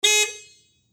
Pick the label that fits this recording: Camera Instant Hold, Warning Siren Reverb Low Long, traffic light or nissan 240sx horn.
nissan 240sx horn